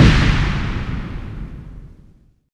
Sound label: Hits & Explode